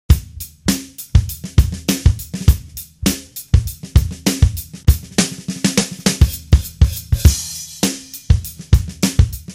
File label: loop drums; WMBonusXP